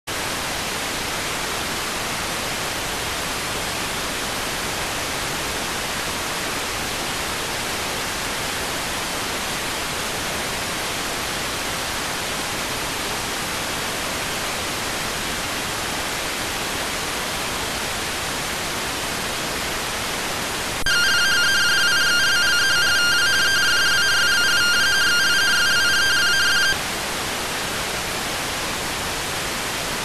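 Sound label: radioteletype receive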